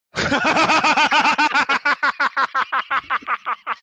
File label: Laugh